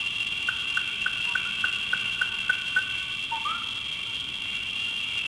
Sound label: swamp; Splashdown; XBOX; Soultree